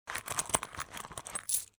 money noise

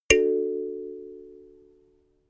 notification kalimba triad major

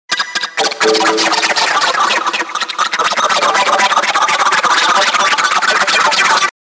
guitar cut

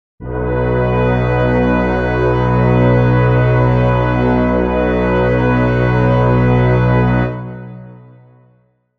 brass chord b major

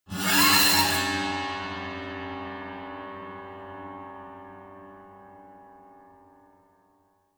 piano bow string broken high

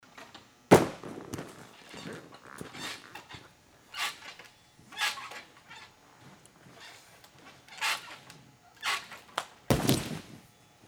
men high bar

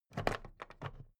plastic clasp open regular

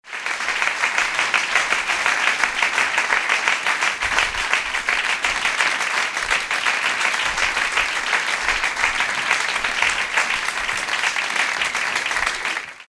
applause medium

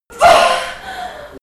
birth mother moaning

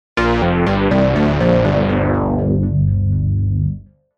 dark synth down

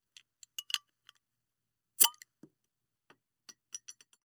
beer bottle opening

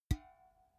big can empty hit soft

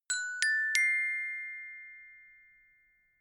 notification glockenspiel triad up diminished

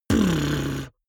beatbox bass drum damped

